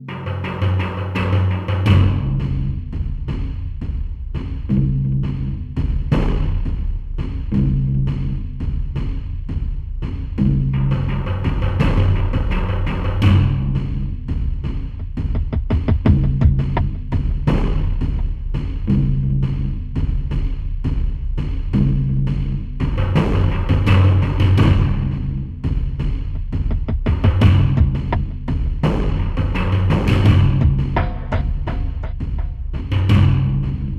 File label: Attack Loop; Silent Hill Downpour